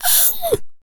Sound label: VOICE FX, Dance Vocals